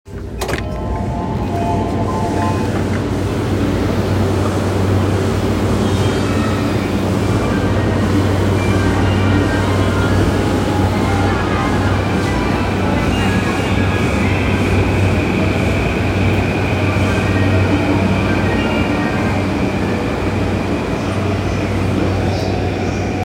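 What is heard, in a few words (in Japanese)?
山手線上野 clockwise, train sounds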